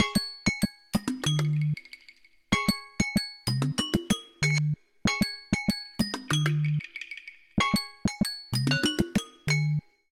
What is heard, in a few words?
Chime; Samsung Galaxy S23; Chime Time